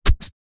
household garden wooden sharp gardening tool stabs into grass soil single